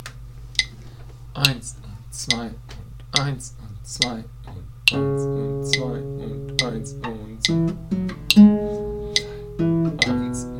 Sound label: campana